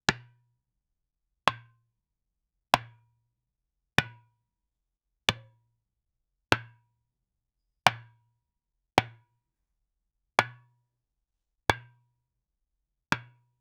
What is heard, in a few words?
pickleball paddle hitting, outdoor